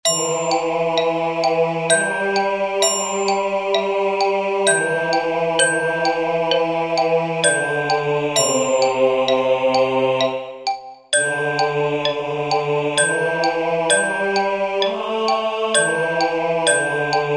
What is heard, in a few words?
VOICE